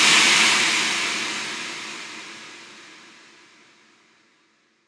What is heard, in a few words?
Noise Impact; Psytrance; FX